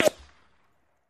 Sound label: Bullet Ricochet Sin